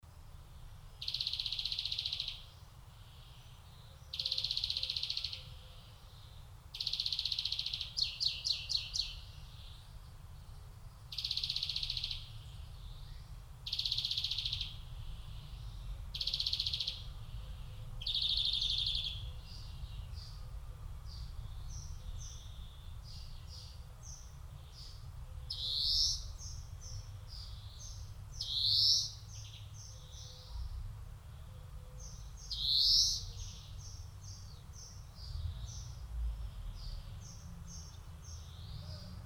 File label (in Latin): Chloris chloris; SETE